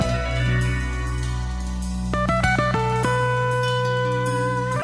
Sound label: guitar elegant gypsy, Phonky Loops